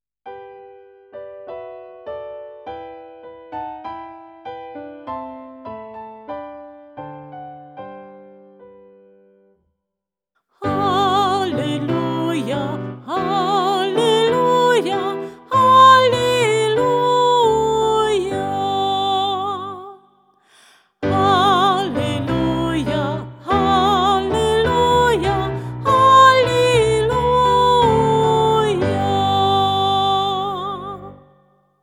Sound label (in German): Halleluja; Gottesdienst zuhause; sechster Sonntag der Osterzeit